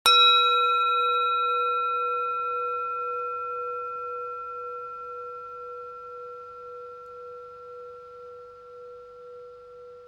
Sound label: tibetan bowl - cuenco tibetano; dusum sangtong